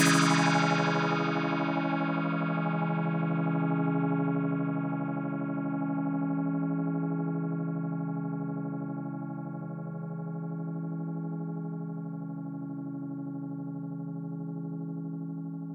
GUITAR ECHO

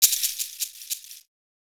shaker FX

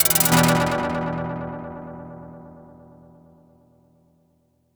GUITAR ECHO

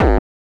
BD KICK FX

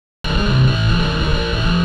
FX GUITAR

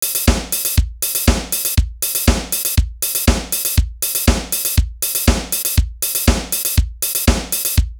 acoustic

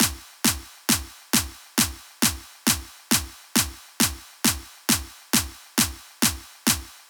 kik clap 135 bpm

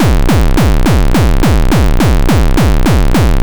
hard kick lP

